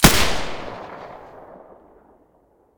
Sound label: silencer g36 stereo, weapons